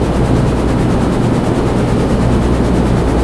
fan; fans

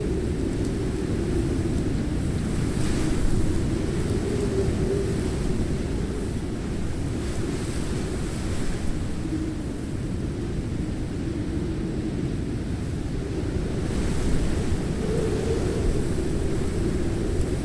strongwind; docks

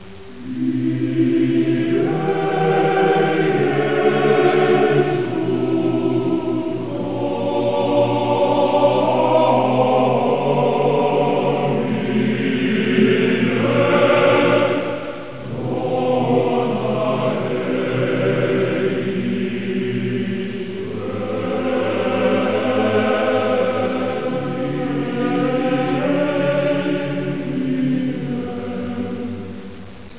church

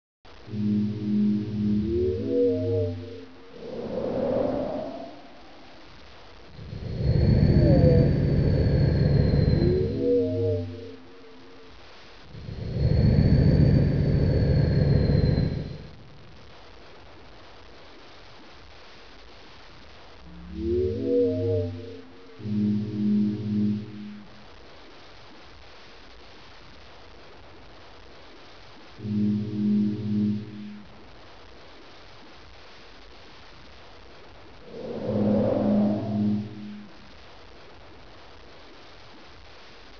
fuzzy

